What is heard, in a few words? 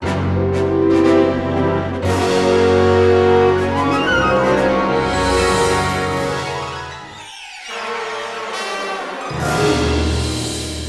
MiniGame Plants Lose; Plants vs. Zombies Battle for Neighborville; ShoreLine